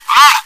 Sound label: run